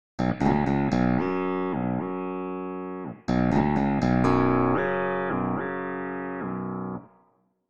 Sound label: pitch bend